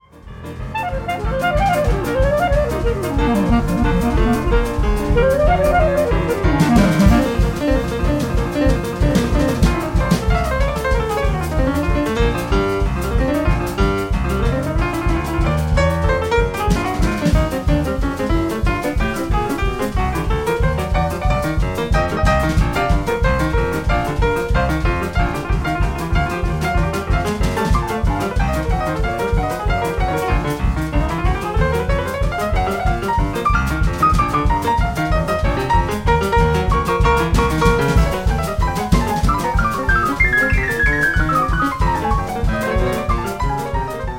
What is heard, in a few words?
Wire Brush Stomp, Trio